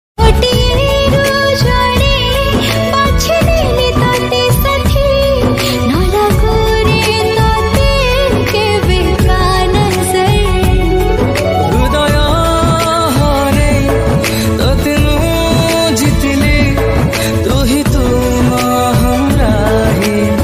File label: odia ringtone love ringtone odia romantic love ringtone